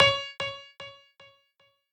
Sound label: piano sabi